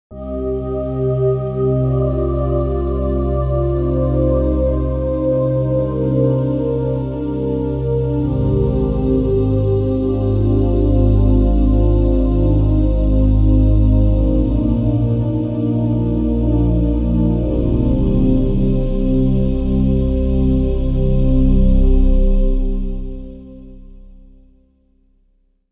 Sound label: Breathy Pad